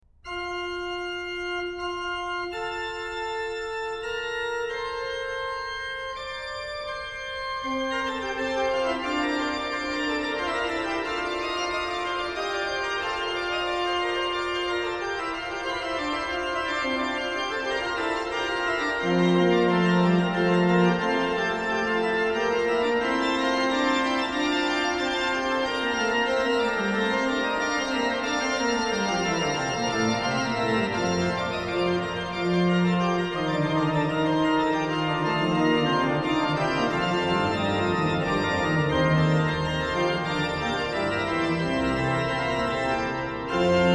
organ; BWV0703 Fughetta sopra Gottes Sohn ist kommen; BACH; BACH ORGAN WORKS; CHORALE PRELUDES

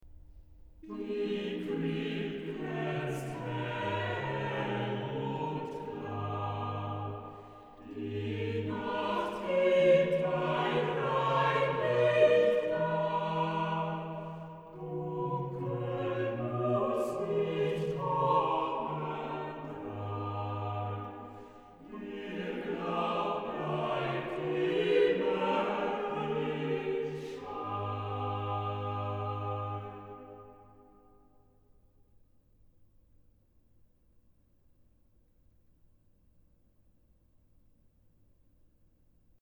Chorale, organ; BWV0036; Die Kripp glanzt hell und klar; BACH; BACH ORGAN WORKS; CHORALE PRELUDES

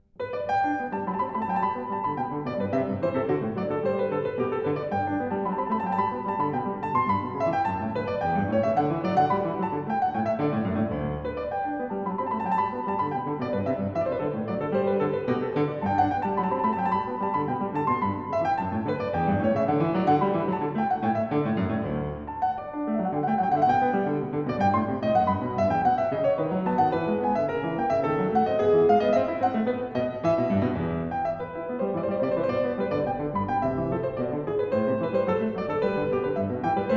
Bourree, KLAVIER; French Suite No 5 In G Major; BACH

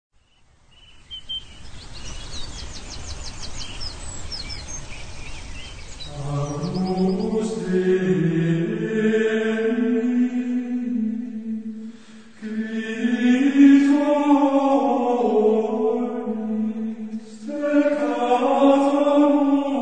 Agnus Dei IX Gregorian C

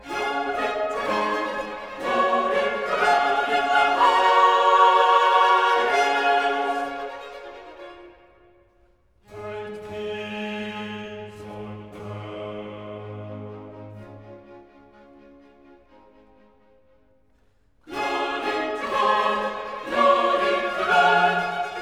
Chorus Glory to God in the Highest; HANDEL; MESSIAH

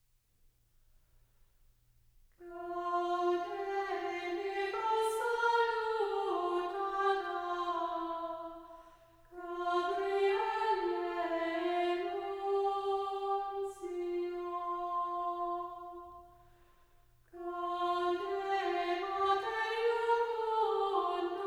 chant; Prosa; Gaude virgo salutata; An English Ladymass

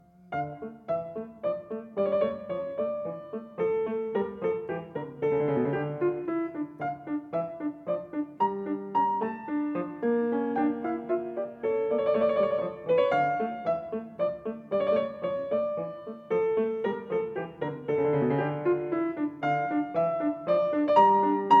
Menuett II - Menuett I da capo; English Suite No. 4 in F major; BWV 809; BACH; ENGLISH SUITES

